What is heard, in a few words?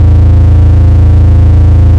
engine; Kenney's Sound Pack; Retro Sounds 2